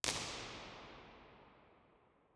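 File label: impulse response, nuclear reactor hall; b-format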